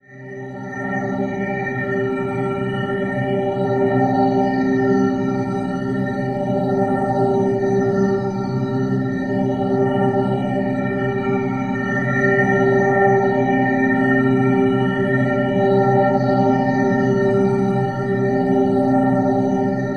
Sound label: GLISS; Zero G; Malice In Wonderland